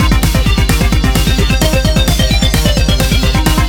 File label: Electro Ultra